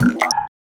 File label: Wet Reverse; FREE SFX PACK VOL 3